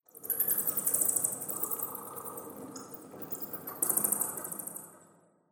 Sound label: amb tenement bats; Half Life Alyx